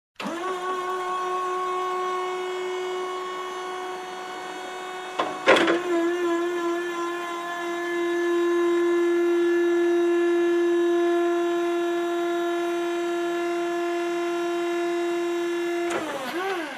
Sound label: Hydraulic Machine being operated